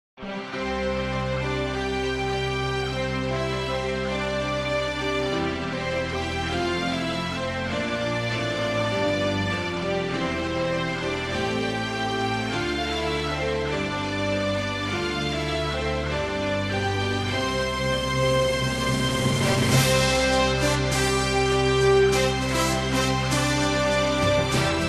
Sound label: IIHF Anthem